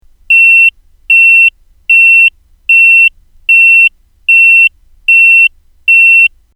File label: slow pulse; Transducers USA